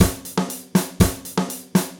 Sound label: Gretsch groove 90 BPM, 1967 Gretsch Kit drums; 120 BPM; Sonic Foundry (Sony Creative Software); Vital Drums